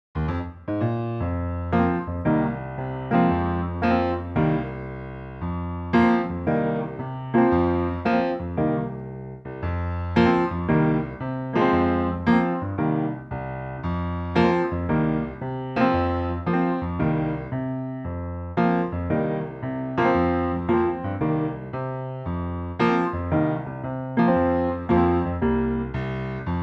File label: Fm piano; key - Fm - vocal range - C to Bb